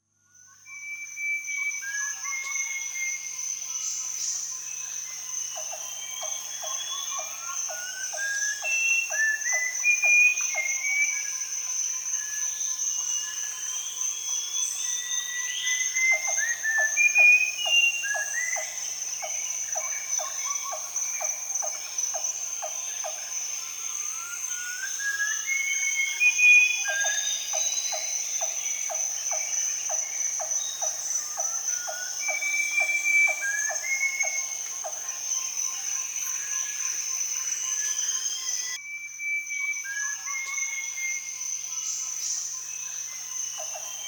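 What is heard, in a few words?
birds singing in the jungle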